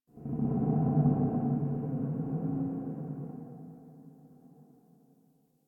interior texture large moan; Ambience; Misc Indoor; DE; Warframe; Infested Eris

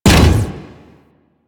Moa Leap Land Sweetener; Warframe; Venus; Venus Terra Moa; Abilities

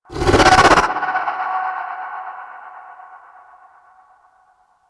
Focus Operator Cast End; Warframe

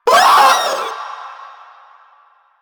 Vocal Short Attack

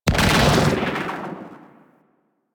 Transference Frame To Operator Cast; Warframe; Focus

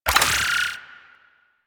Glaive Full; Warframe; Weapons; Ghost Catcher

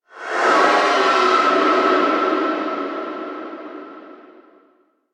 Shrine Ghost Cry C; Warframe; Revenant

